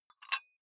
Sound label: dumbbell take off very small weight gentle